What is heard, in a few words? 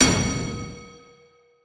death bell fast; gang beasts